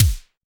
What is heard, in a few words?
SDS5 kick, Simmons; Simmons sds5 kits